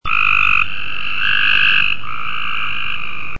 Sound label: copes gray treefrog